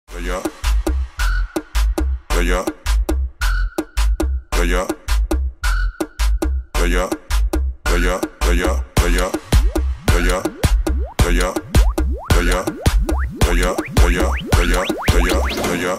tribal mix club

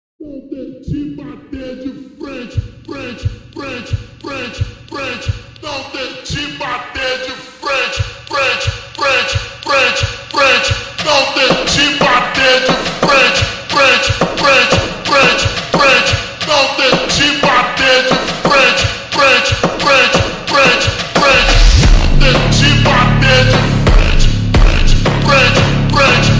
slowed reverb

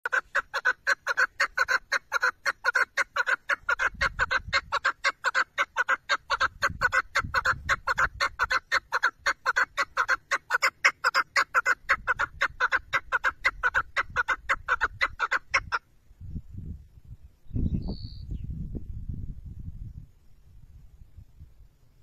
partridge sound is beautiful in nature; keklik sesi dogada guzel

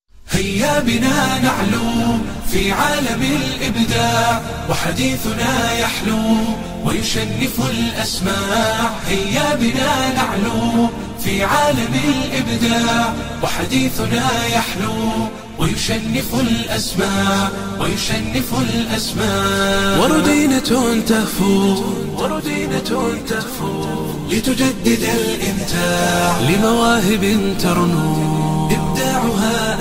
ocen krasiviy nasid beautiful nasheed

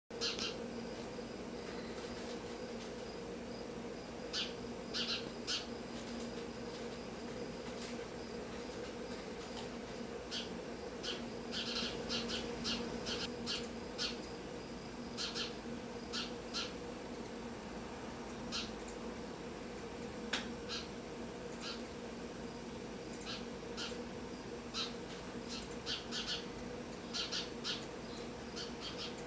accipiter on the sparrow trap; lochin uchun qopqon

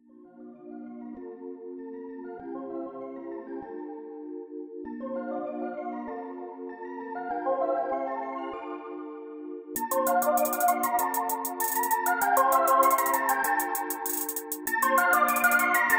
slowed reverb

